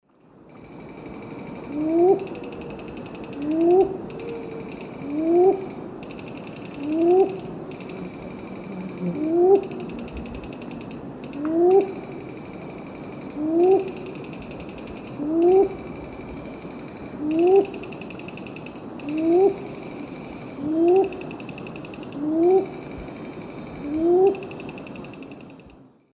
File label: Neomorphus pucheranii, song; S. America; Eastern Lowlands